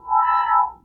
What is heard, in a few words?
Scifi Menu Tone; Menu FX